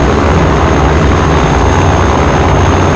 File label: engine; planecr